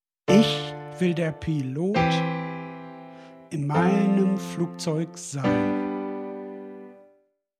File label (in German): chorus betonte silben schwere zaehlzeiten; Songwriting Grundlagen